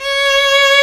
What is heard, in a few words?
VIOLIN C#4